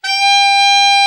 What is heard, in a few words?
BRA ALT, SAX; Best Service Gigapack 1